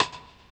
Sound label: RAGGA; Spectrasonics; Metamorphosis; RAGGAVYNL